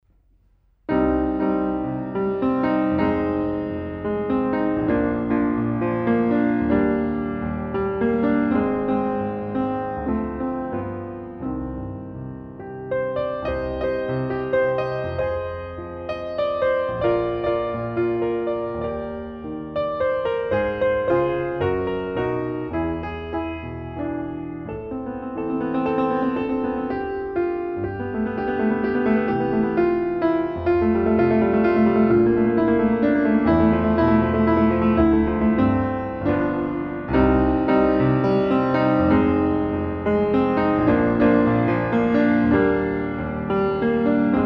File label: Autumn Ballad, piano